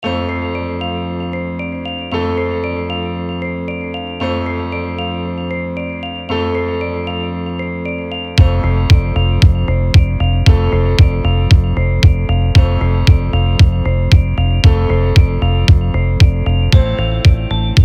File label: Peaceful Harmony